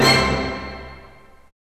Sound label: ORCHESTR HITZ